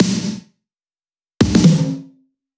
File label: 128BPM, House Electro Fills; Vengeance Samples